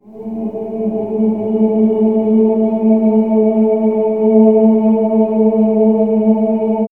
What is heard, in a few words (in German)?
LOW CHOR; ATHMOSPHERE